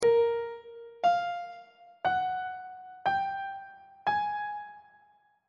b flat dim6 min6 maj6 aug6 intervals on treble clef